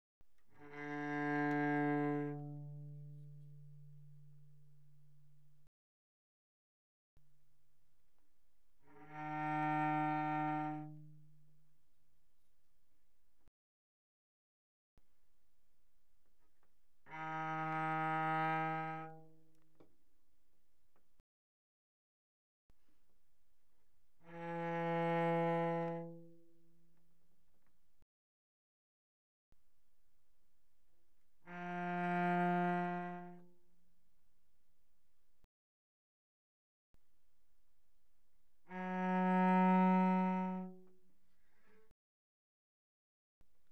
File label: Cello arco pp sul D D3B3; Strings